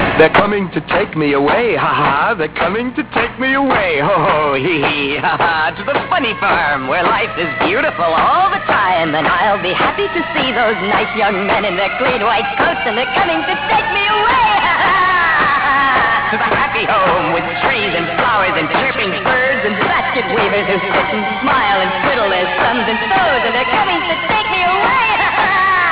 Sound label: haha